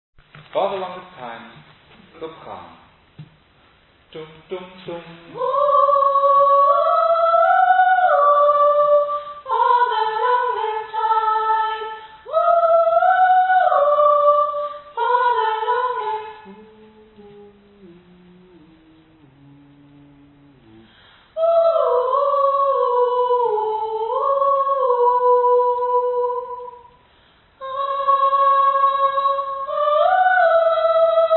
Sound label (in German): Sopran